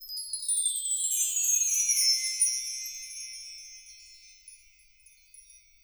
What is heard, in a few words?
Chimes